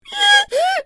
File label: Pigfall; bad piggies; android; mobile; windows